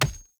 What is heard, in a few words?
Grunt Death Default; Sinecure; Melee Grunt; Enemy; Death Effects